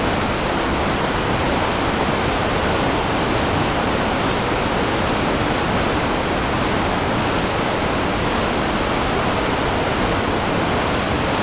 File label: large waterfall; de port arctic